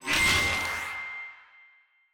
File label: ladder Initiate; netent